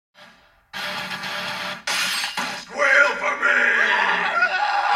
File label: Squeal for me